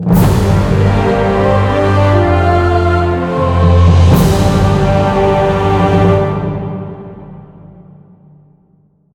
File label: Match End Short; smite gamerip 2015